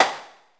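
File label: SLAP SNARE; DRUMS & PERC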